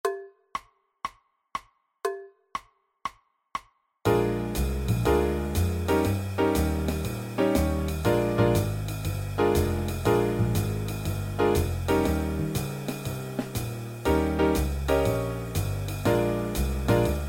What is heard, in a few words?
blues